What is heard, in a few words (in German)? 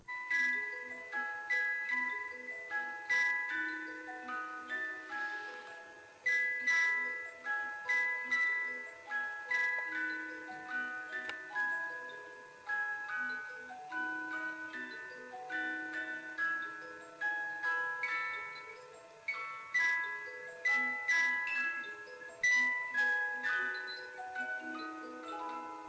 spieluhr